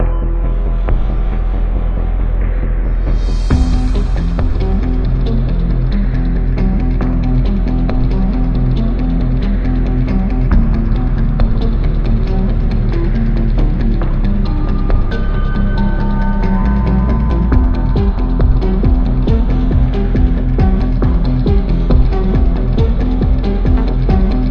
ashen volcano; mage gauntlet; android

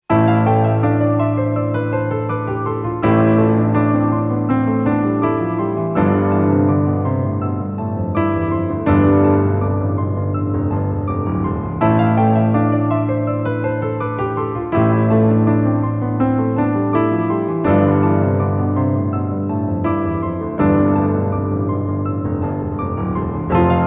Theme of Isha (piano version); Gigawing

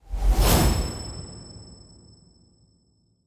Lootdrop Start

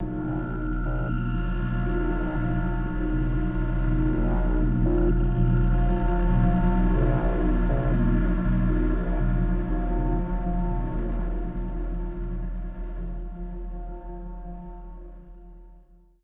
sounds music; Halo Combat Evolved; Xbox

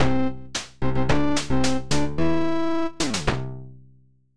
end level; Merlin's Adventure; Android

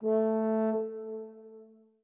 orbit hornsolo; Sky Children of the Light gamerip

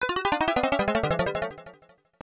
Death; Ghouls n Ghosts; Genesis

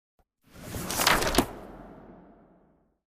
Book Close Front

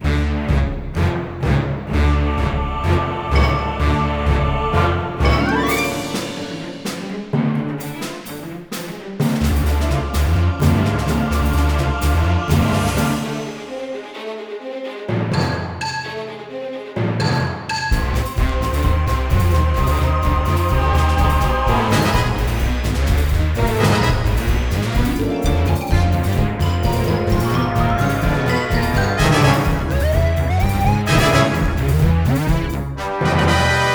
Boss Loop; RoboGargantuar

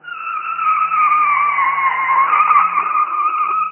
Skid; Buggy; PS1

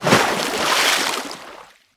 jump; Piranhas

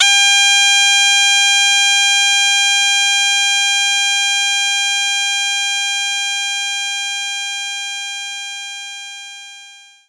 Shenai Ad3

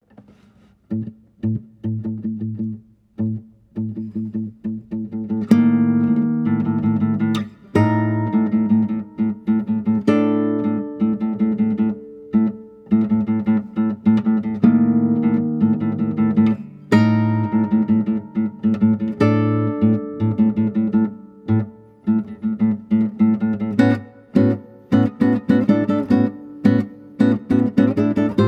Skyship Background Music, guitar; Super Mario Bros. 3; super mario series for guitar 2010

